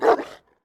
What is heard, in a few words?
Dog St Bernard